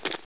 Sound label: bandit run step left; gearbox